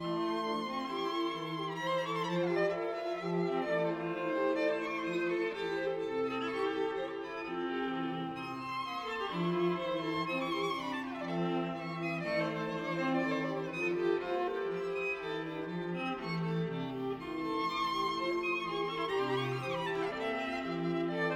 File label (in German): Streichquartett; Cerha; 2. Streichquartett